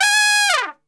G#3, Brass, TRP; Best Service; Brass Super Section

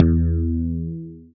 BAS TX-802; Best Service Gigapack 2

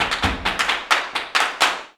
JALEO TAP, Flamenco

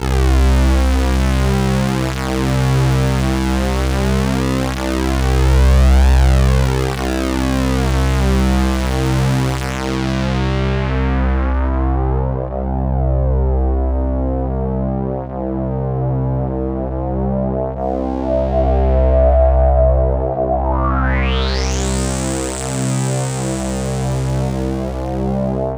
C1, Roland JV 2080 CLUB ORGAN

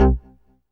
bass BASS; Best Service Gigapack 2

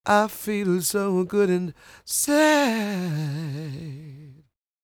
croon, Vocal Planet Blues Soul; Spectrasonics

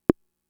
Conga, Fricke MFB-522

